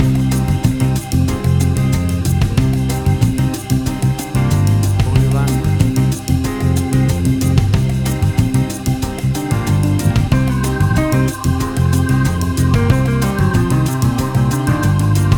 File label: Acid Jazz Bossa Nova Fusion Music